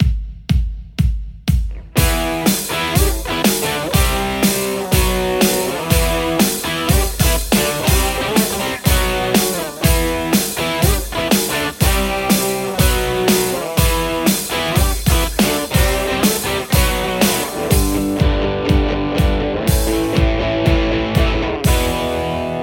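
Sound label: Telecaster; poulin legion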